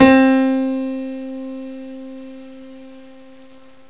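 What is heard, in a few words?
grand piano; gmplay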